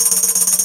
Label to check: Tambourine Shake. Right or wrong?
Right